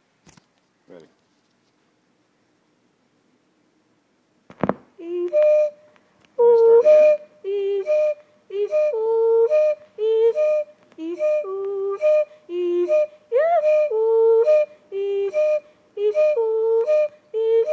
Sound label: Pigmy music